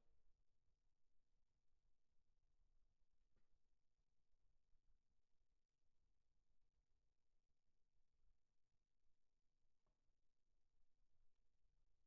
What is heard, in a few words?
Snare Bottom, 94bpm